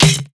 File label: Perc; Sample Banks; Percussion